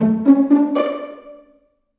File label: Pizzicato Strings